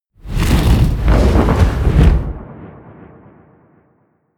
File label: whoosh flutter